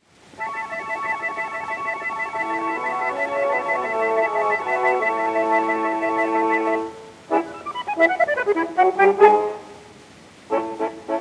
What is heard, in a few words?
Moonlight Waltz